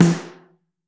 SN2 Mono, Snare; Ableton Foundation; Snare Designer

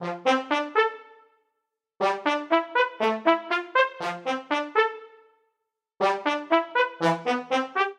Phrase Tenacious A Minor 120 bpm, Brass Quartet; MIDI Clips; Ableton; Spitfire Audio

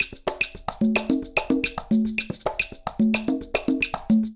Guaguanco 1 220bpm, Latin Percussion; Ableton; Factory Packs

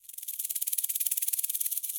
Cabasa FX; Latin Percussion; Drums; Drum Hits; Shaker; Ableton; Factory Packs

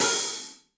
Hihat Open Ambient Loud Punch; Drum Hits; Ableton; Factory Packs; Session Drums Studio; MPE Hits

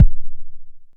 analog deep, Kickbank; mpk mini presets; Ableton Foundation FreePacks

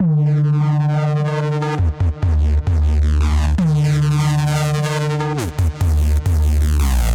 Tense Drops - Dmin 134bpm; MIDI Clips; Tonal; Atmospheric; Ableton; Factory Packs; Mood Reel

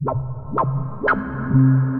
Pads; Residual

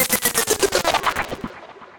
Bit Bite; Effects; Ableton; Glitch and Wash

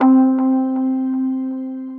Dripping Keys; Instruments; Broken Keys; Ableton; Factory Packs; Mood Reel

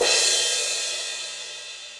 Crash 18 Room With A Vista, Cymbal; Drums; Drum Hits; Standard Hits; Session Drums Studio

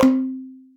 Light String Pluck; Instruments; Plucked; Ableton; Synth Essentials; Tension

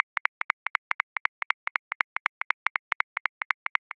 Swing Hip Hop, Drum Booth, Grooves; Ableton

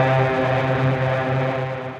Synth Lead; Pure Form

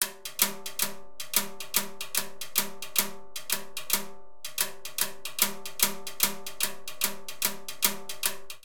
Funk 2 Timbales 111bpm; Latin Percussion; Ableton; Factory Packs

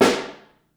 Room, Snare

